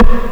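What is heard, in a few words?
PERC WOOD, percussion kit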